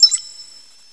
button click release; hl1mp; XDM

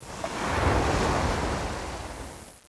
water ocean wave break; hl1mp; dm tropic

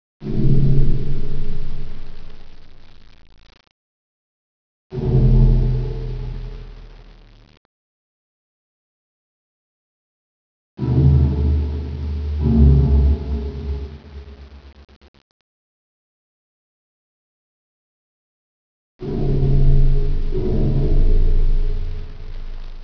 Industrial; hl1mp; Loopers

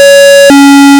computer alarm; XDM; ambience

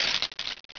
debris; XDM

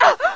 pain; hl1mp; XDM; hassassin

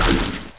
push flesh; XDM

